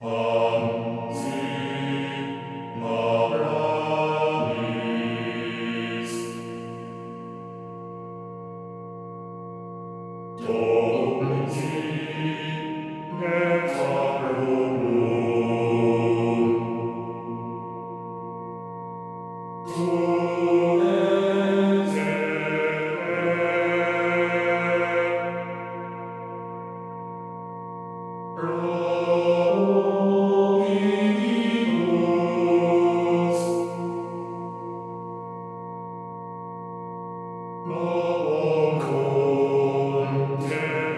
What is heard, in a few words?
BGM Quiet; aster tatariqus android ios mobile gamerip 2023